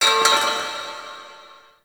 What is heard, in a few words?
Metal Debris; Warframe; The New War; Zariman